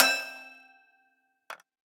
MPE Prepared Toy Piano; Instruments; Lost Keys; Ableton; Factory Packs; Lost and Found

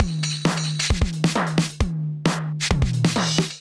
DMX Kit groove 133 bpm; MIDI Clips; DMX Admission Kit groove; Ableton; Factory Packs; Drum Essentials

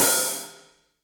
Hihat Open Dark Plate Verb; Drum Hits; Ableton; Factory Packs; Session Drums Club; MPE Hits

